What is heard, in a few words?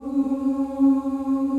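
D3 FEMALE CHOIR, OOS; Northstar - New Gold VOL-2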